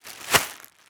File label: plastic bag shake